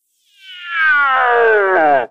Round Modulated Transition

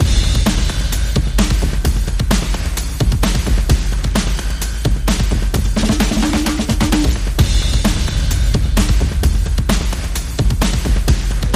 Perc and FX Only

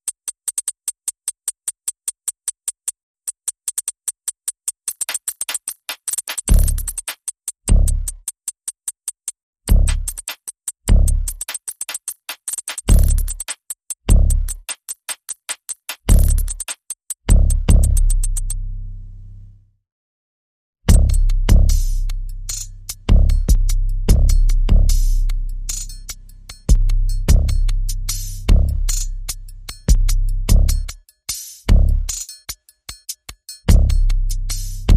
Bass and Perc Only

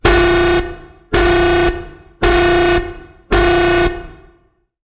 Nuclear Alarm

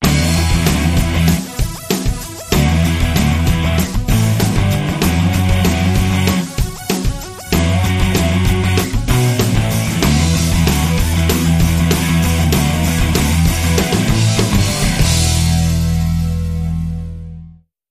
Rock

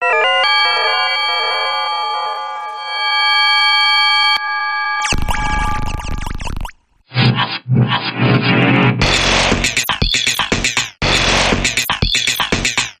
Random Tune Sci Fi Bumper

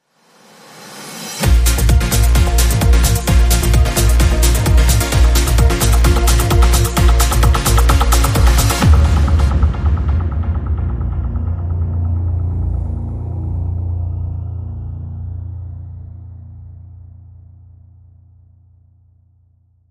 Bumper

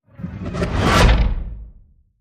Airy Door Hit

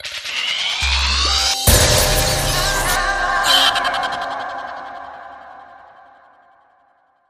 Rise N Smash Sting

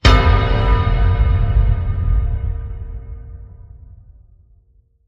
Low Bell Strike

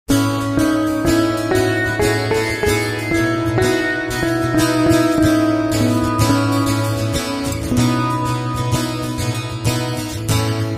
Acoustic Dream

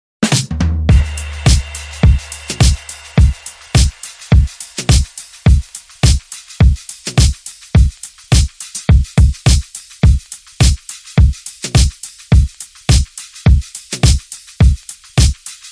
Beat

